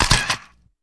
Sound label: 35mm Camera Auto Adv; shutters & movement